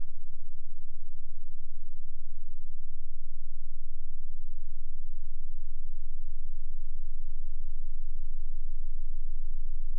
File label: sweep; 7-14 sweep 10sec; 7-14 range test